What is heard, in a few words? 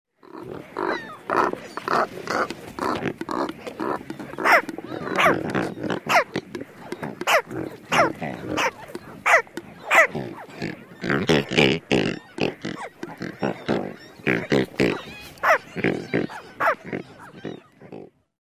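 adult croaks and barks of nestlings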